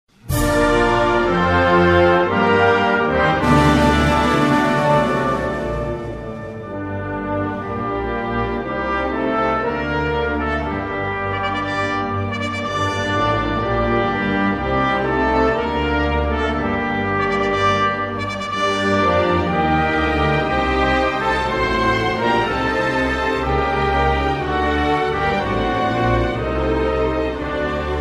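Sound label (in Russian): gimn bolgarii instrum, Bulgarian anthem instrumental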